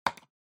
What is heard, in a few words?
PC Mouse C Hard